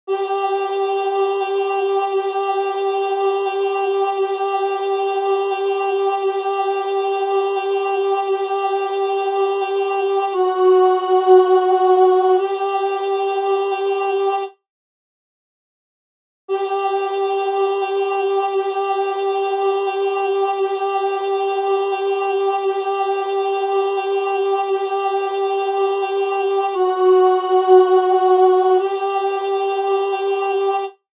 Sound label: Voice Ooh verse